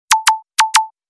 Ticks Ringtone

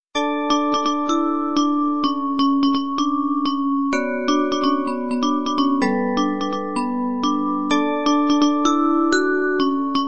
Instrumental Ringtone

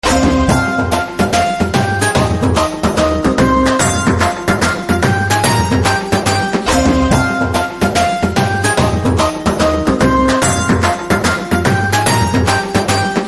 Shehanai BG Instrumental Tone, Telugu Ringtone

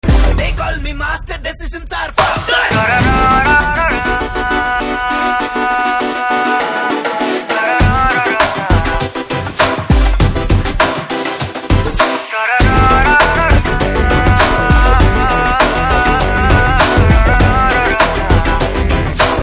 Raid BGM Telugu Ringtone

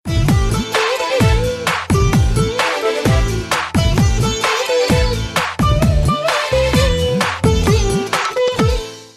Veena BGM, Telugu Ringtone; Jalsa

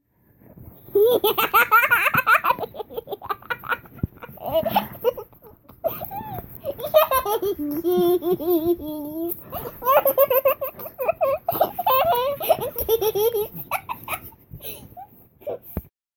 Baby Laughing Ringtone

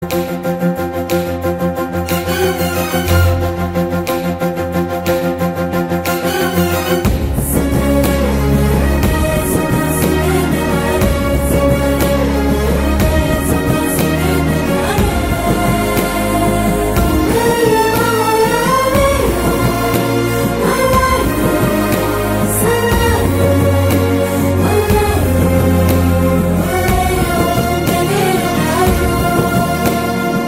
Instrumental BGM Telugu Ringtone; Nippu

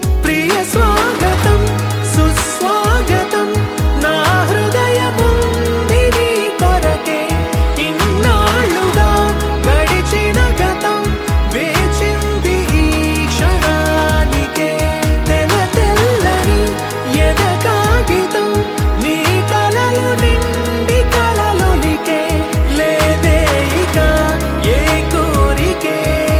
Telugu Ringtone

